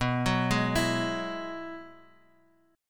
G sharp Minor 7th Sharp 5th B

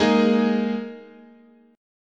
G sharp Suspended 2nd, chord

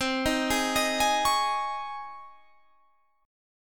C sharp Major 7th Suspended 2nd, C

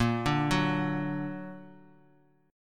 A sharp Augmented, chord; A#+ arpeggiated; x,13,12,11

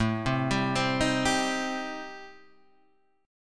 A Minor 7th Flat 5th A, Guitar, Standard; arpeggiated; JGuitar

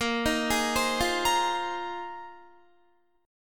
A sharp 9th Suspended 4th A sharp Guitar; arpeggiated

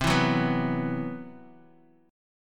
C Major 7th Flat 5th C, Bass Standard; CM7b5 strummed; JGuitar

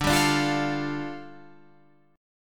G 7th Suspended 2nd

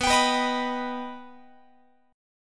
B Augmented B down Guitar; strummed; x,19,22,21,19,22

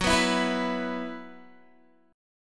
F sharp 7th Suspended 4th, Guitar; strummed; 14,14,14,16,16,x; F#7sus4 Chord

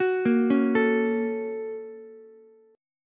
Bb Minor Major 7th Sharp 5th Bb down Ukulele; BbmM7#5; arpeggiated; JGuitar

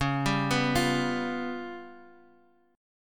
C sharp 7th Flat 5th, Guitar, DADGAD; down; chord shape

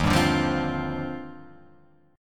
D sharp Major 13th D sharp Guitar Open F, chord; strummed; 3,2,2,0,3,0; JGuitar

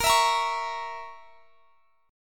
A sharp Diminished, chord

